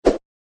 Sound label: jump woosh